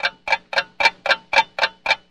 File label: stopwatch, Drum loops